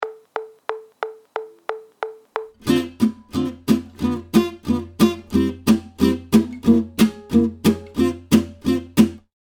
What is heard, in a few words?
ii V I VI ii Lick Rhythm, guitar